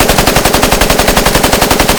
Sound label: shoot loop, tmp; guns; sven; cof